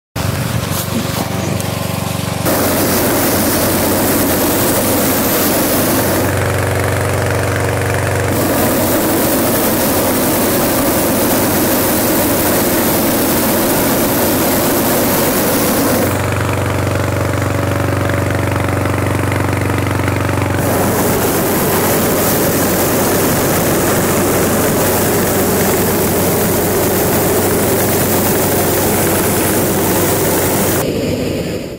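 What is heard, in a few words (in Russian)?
в шар задувают воздух вентилятором и начинают включать огонь для подъема шара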